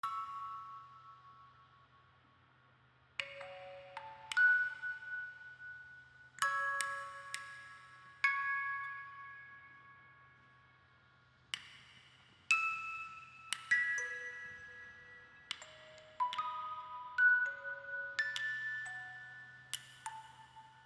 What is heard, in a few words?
Ambiance